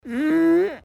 fart

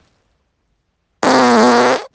fart lmao

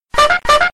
double skull trumpet

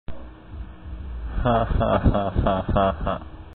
ha ha ha ha

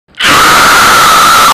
loud scream

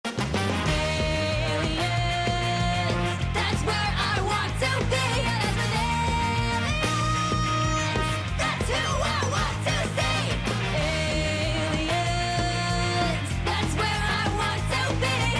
ska

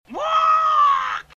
wooooaaaah; zelda oot

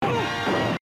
kapow; batman

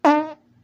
hmm fart

